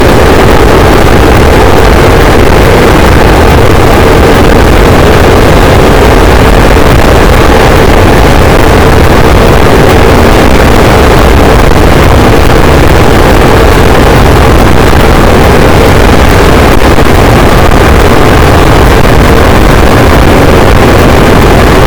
cachalot negatif, boat, Physeter Macrocephalus

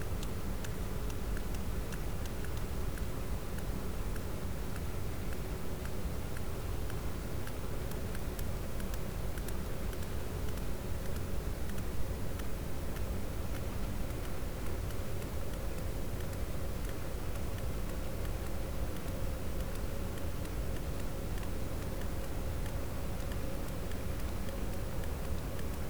cachalot positif, Physeter Macrocephalus

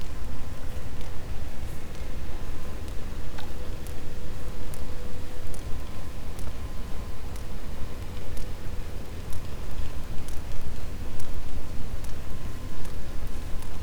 cachalot positif, Physeter Macrocephalus

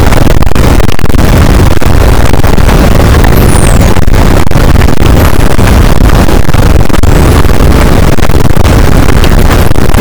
cachalot negatif, boat, Physeter Macrocephalus

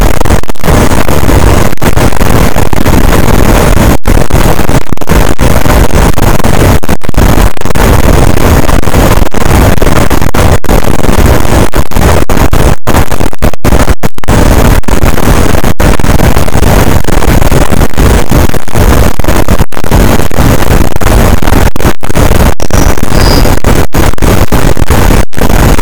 cachalot positif, boat; Physeter Macrocephalus

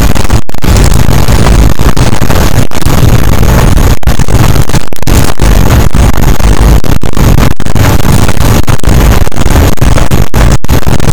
cachalot negatif, boat, Physeter Macrocephalus

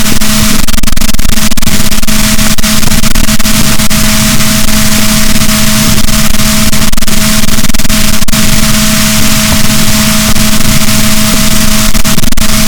rorquals positif, BalaenopteraPhysalus, boat; SNR 6dB

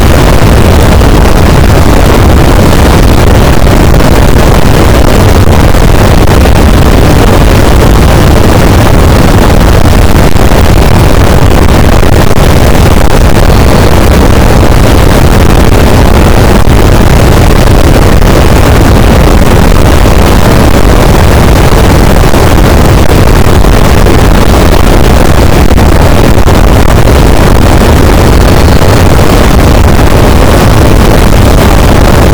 cachalot positif, boat; Physeter Macrocephalus

